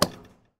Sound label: fuelstop; interact-sound